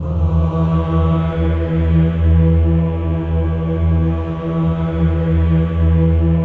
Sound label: VOWEL